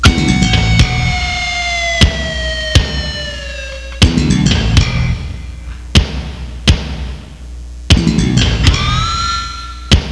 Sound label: progress short; QuickShot Demo; QuickShot v1.0